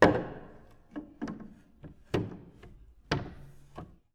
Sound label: Music Stand, Piano; Piano Miscellaneous